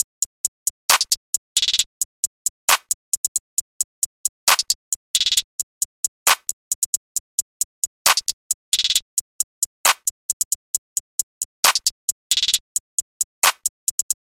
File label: loop